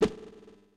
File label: Whiff; Super Smash Bros